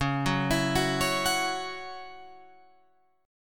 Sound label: Db Minor Double Flat 5th, Guitar, Open F; 13,13,16,13,16,13; arpeggiated